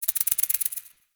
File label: Interior Spider Crawling; Ambience; Warframe; Duviri; Murmur